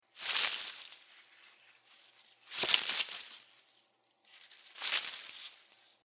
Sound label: bush shaking